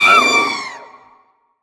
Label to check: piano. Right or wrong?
Wrong